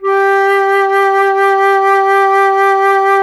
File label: FLT FLTG, Flute; Miroslav Vitous - Solo Instruments VOL-2